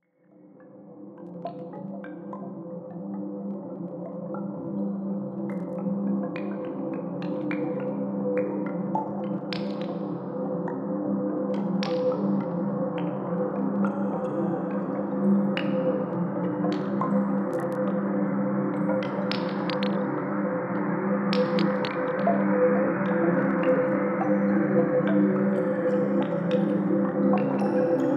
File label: Atmosphere; 99Sounds; InterSpace